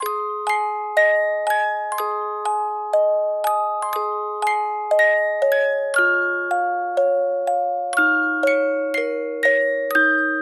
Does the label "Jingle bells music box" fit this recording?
Yes